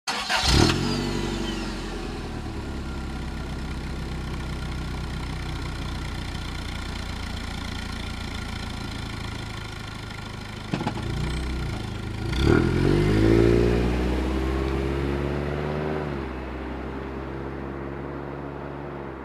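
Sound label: car starts and drives away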